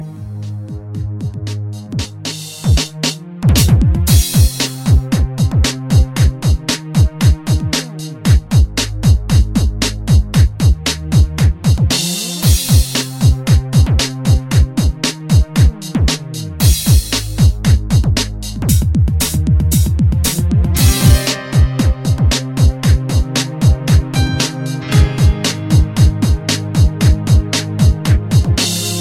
Loooop

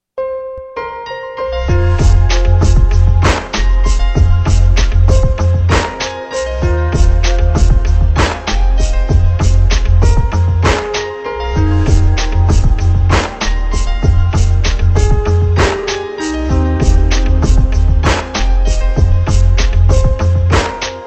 Nokia remix

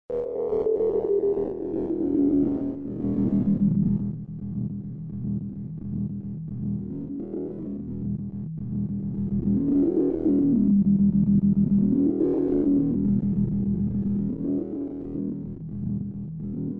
Matrix Engine

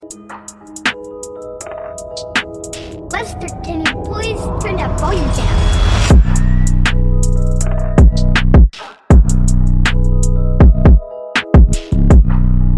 hypnosis lezter beat

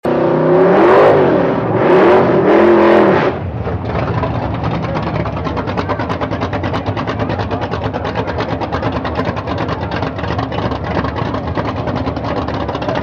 Muscle Car

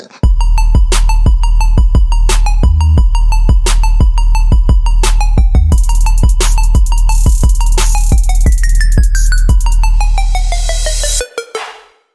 Trap

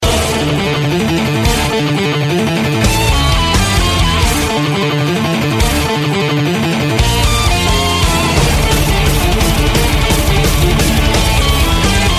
Black metal

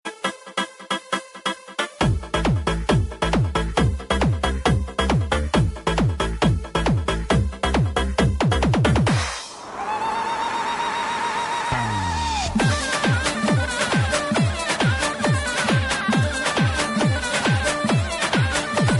Govend

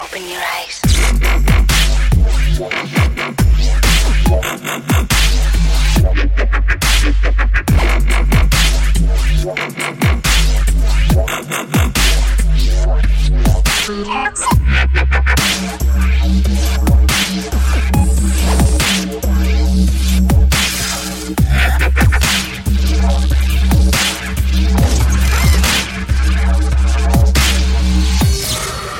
Dubstep; Eyes

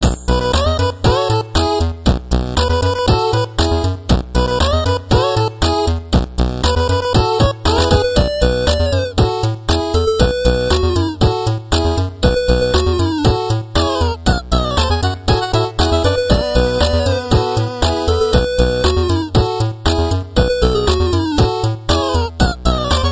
8-bits

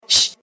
Shhh

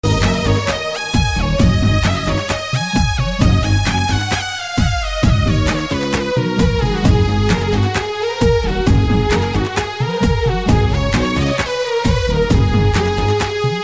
Indian Folk

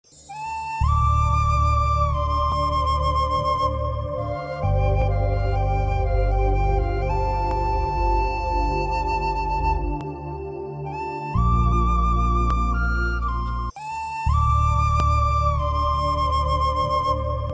Soft Tune; Arya2

